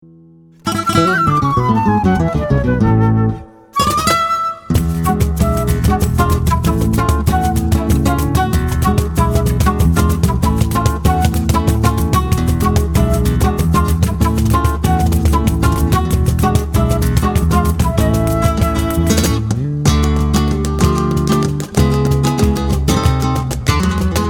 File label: Guajira Flamenco ensemble